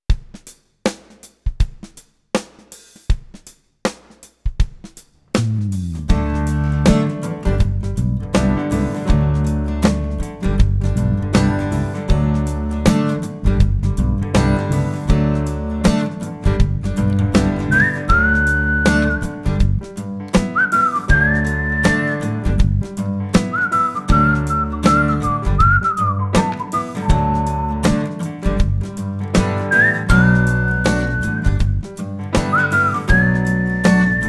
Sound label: happy song